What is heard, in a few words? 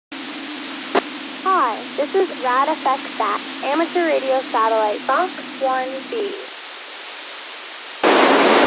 FOX-1B 145MHz966; Hajagos